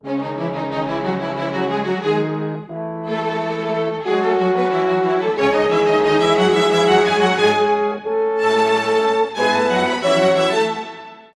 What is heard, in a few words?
90 c# min full mix, orchestral arrangements